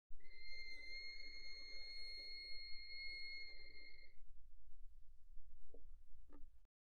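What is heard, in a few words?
Violin arco pianissimo sul A C7; Strings; violin2012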